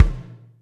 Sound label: BONK, KICKS